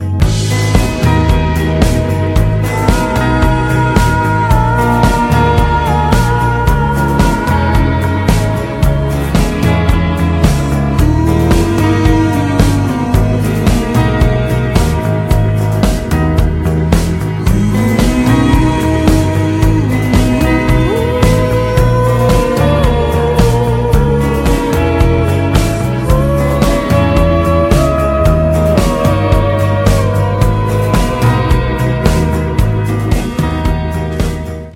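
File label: pleasant rock beautiful instrumental break with voice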